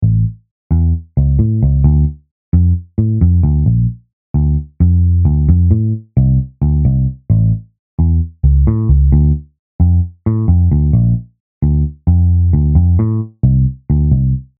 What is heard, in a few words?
bass